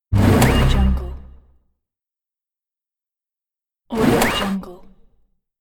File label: user interface mechanical notification